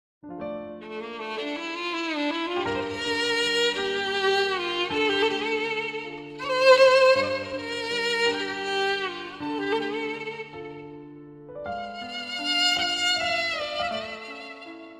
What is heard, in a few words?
violin